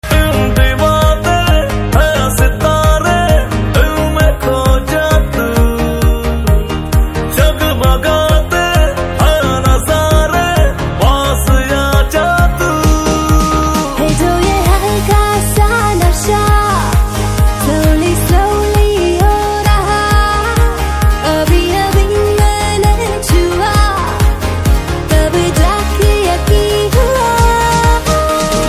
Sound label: BG Tone; Race 3